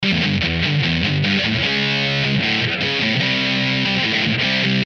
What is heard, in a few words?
150 Verse Speed Rock G